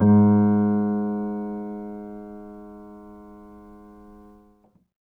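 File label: Acoustic Piano, Left; InVision Interactive; Miroslav Vitous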